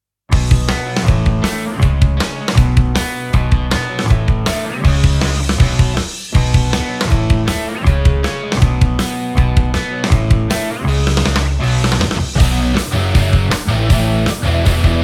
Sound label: Punk, pickups; NORD